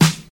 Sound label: studio snare drum sample D# key, Royality free snare drum sample tuned to the D# note.